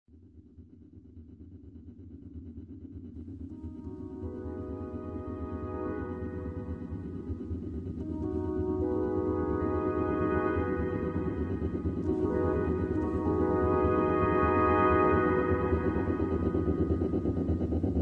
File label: Diesel Train Boogie, hammond